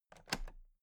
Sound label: toolbox latches open regular